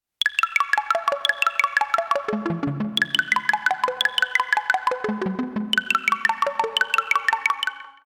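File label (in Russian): gentle sounds for sms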